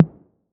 808 SOFT; Wizoo - Platinum 24 Electronic

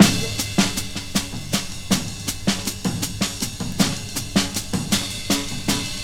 TRIP RIFF; Soundengine - CSR-000 The Art of Transwaves; PERC WAVES

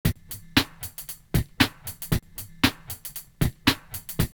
Straight Flush 116, drumloops OldSchool; X-Static Goldmine

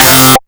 DIST SHORT; Big Beat Samples & More; AKAI; DISTOTHER KT

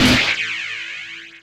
D+B

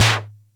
Music Percussion Computer Tom, Drum Machines

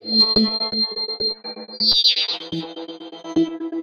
Glitch n Glitz, Synths; Sonic Foundry (Sony Creative Software); Underground UK House & Electro

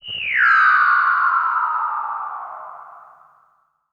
Simple OSC Spring Reverb

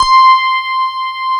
FM ELP C5 L, Keyboards Old School; USB Soundscan vol.09; AKAI; FM ELP 2

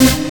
SYN, Jungle; E-Lab; XTC Files of Jungle

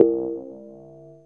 ANALOG BELL; Zero-G; Ambient 1

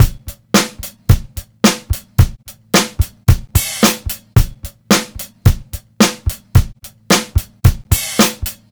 beat, Roots Funk; USB Soundscan vol.41; AKAI

